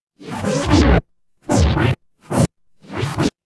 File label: magic scifi teleport